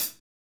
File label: Closed Hats